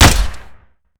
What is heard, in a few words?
machinegun fire